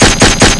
fire weapon; bioclone

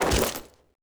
pig attack miss; A Machine for Pigs

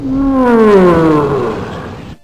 god mode ambient; rott

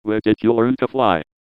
jeers; John Madden

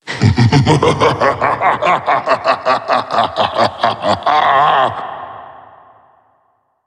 laugh; slender; meph

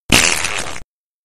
fart

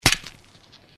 flesh hit

